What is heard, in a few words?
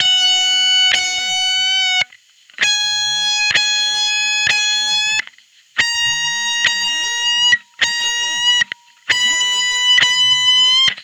Amp Clean with Overdrive aliasing